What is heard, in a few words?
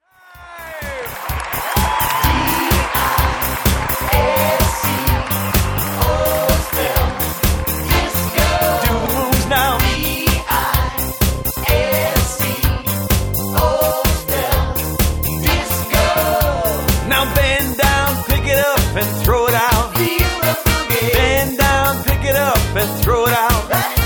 disco